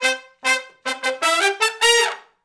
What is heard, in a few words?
Shuffle Blues Riff (C) unison, Horncraft for R&B Sections